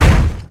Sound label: boulder impact stone